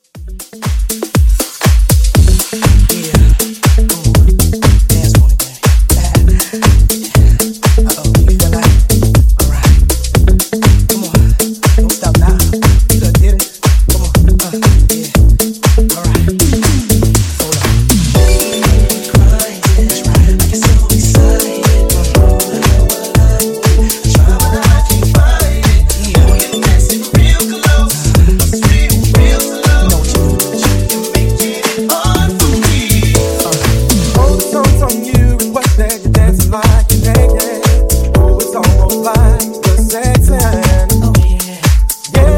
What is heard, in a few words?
Funk Nu Disko 120Bpm